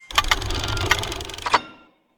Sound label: Ring Puzzle